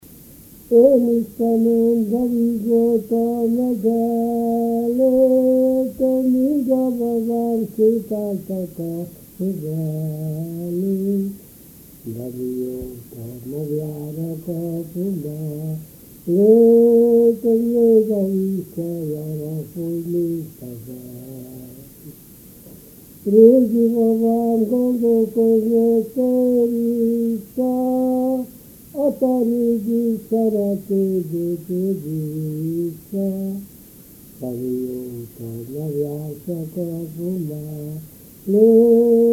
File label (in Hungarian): verbunk; magyar; MTA BTK Zenetudományi Intézet; Juhász Zoltán; Sáringer Kálmán; Mészáros Ferenc